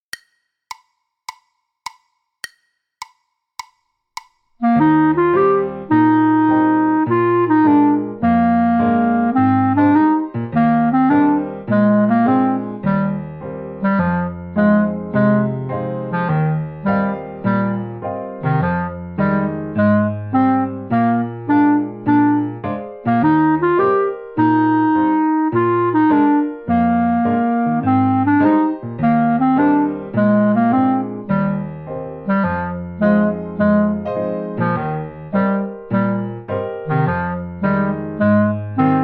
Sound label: Etude, Jazz Clarinet; Beginning Jazz Clarinet Studies Vol 1